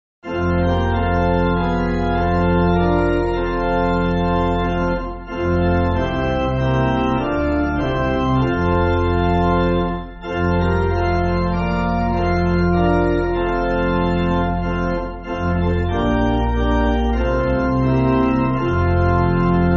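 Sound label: Pipe Organ, Backing Files for Churches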